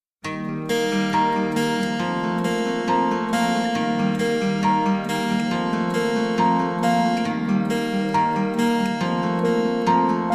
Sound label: karaoke instrumental; where did the rock go; school of rock